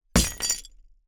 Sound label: glass